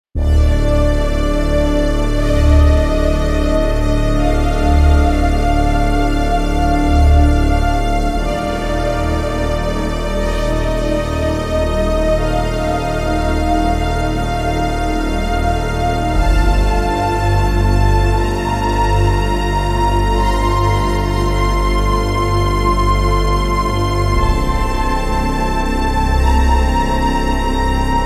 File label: Scifi Pad; Bogart VGM